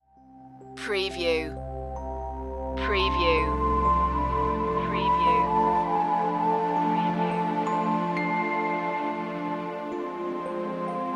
ambient clouds

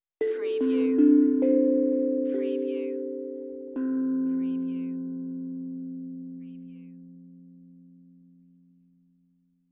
Tongue Drum Meditative Tone; beanstalk audio

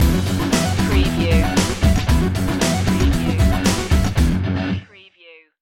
rock invictus stinger

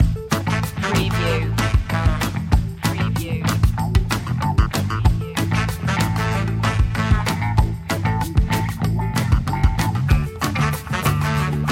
hot funky groove

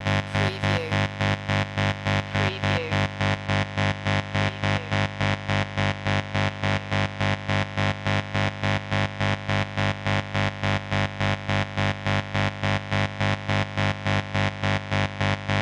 Electric Static Hum Pulse

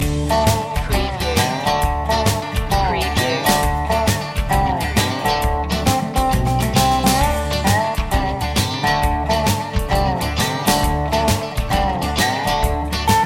highway blues loop

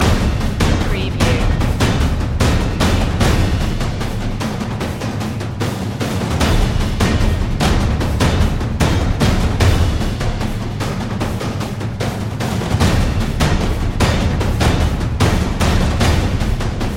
toms of tension loop

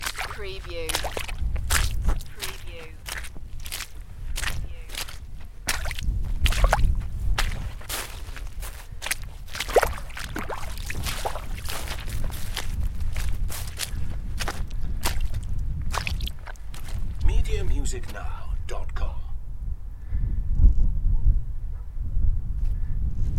Hiking puddles and wind; beanstalk audio

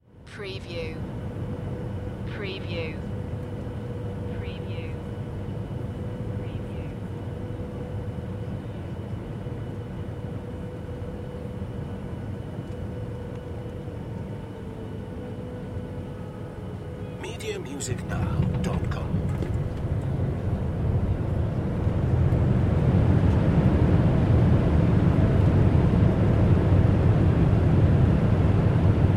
Airplane Landing Int; Vehicle / Transport Sounds / Aeroplane Sounds; sound effects bible

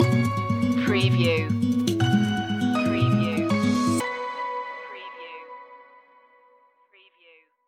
together we go stinger

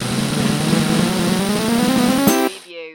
Casino Slot Machine Winning Count

